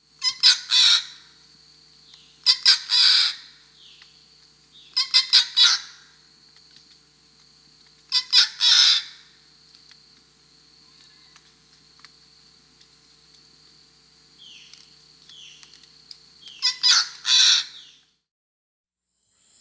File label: Red-throated Caracara (Ibycter americanus), Peru